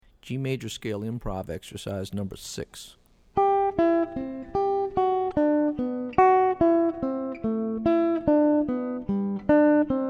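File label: G Major Scale Improv Exercises; lead skills materials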